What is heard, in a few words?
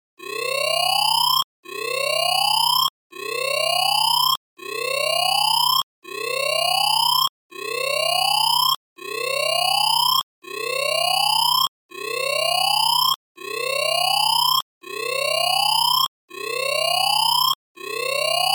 science fiction alarm; sound effects one